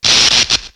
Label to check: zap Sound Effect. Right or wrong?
Right